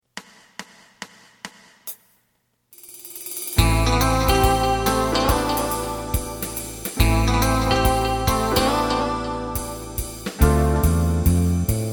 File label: kareha lead